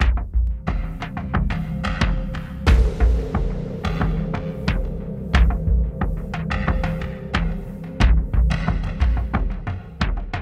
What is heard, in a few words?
Dark Ambient Music